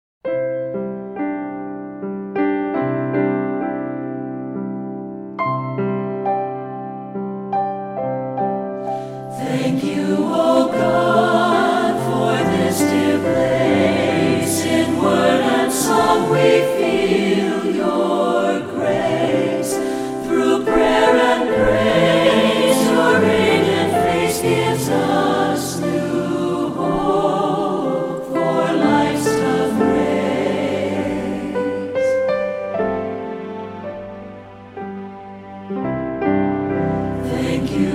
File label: Benediction Vocal